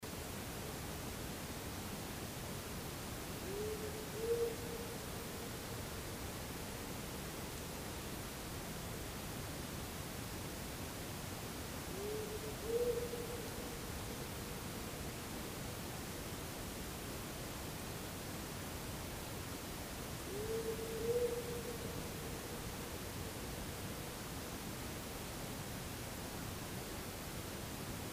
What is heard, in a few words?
Powerful owl; Honeysuckle NFR